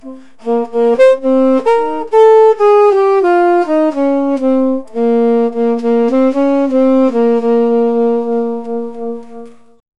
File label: saxophone afrobeat